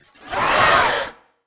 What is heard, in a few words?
Pain